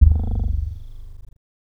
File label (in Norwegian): Strekkdunk